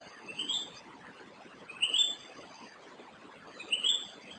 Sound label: Spectacled Bulbul call; Amar